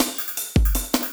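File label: loop snare drum, 160 BPM, Drum & Bass; Ueberschall; Drum & Bass Resonance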